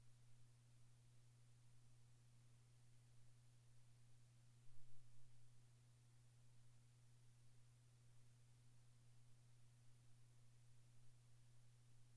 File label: lead